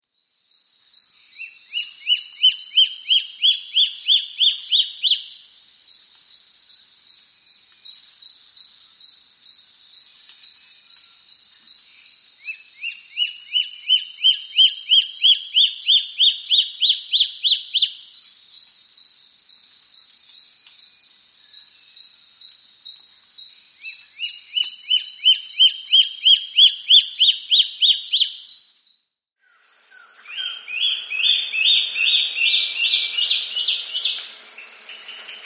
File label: Wing-Banded Antbird; S. America